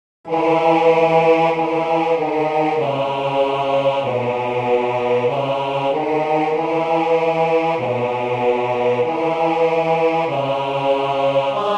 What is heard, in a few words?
Bass; Allein; Paulus